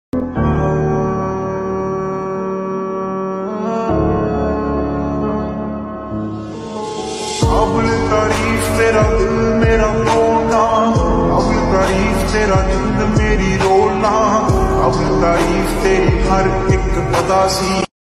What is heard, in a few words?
Sad Ringtone